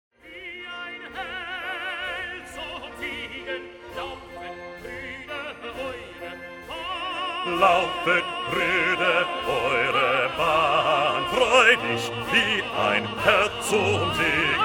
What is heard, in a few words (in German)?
Laufet Bruder, BAJOS; ahora estamos ensayando